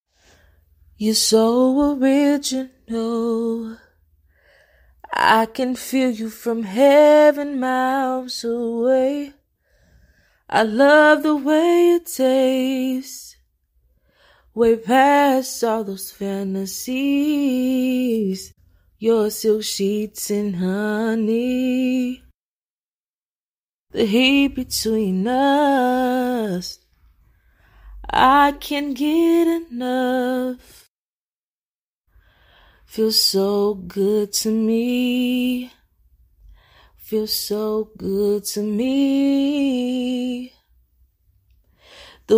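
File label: pop, 60, B major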